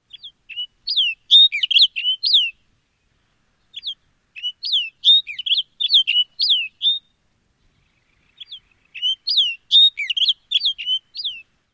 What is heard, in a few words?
songs